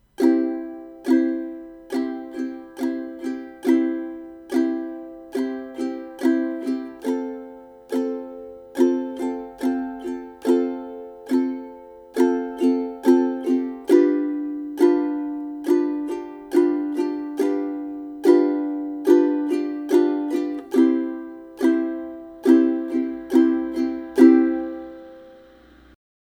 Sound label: 70bpm